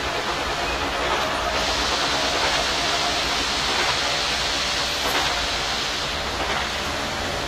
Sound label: factory outdoor, ambient; Source Engine; tf